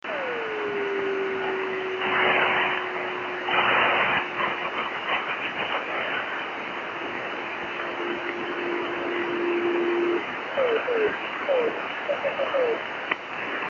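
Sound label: drift net beacons; 26.790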